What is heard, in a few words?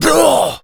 Pain, Zombies; zps; Carrier